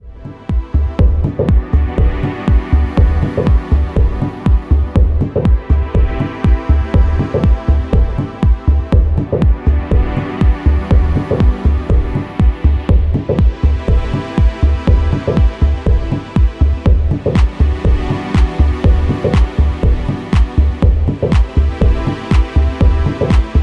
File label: Moody strings